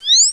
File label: Warning; Defiance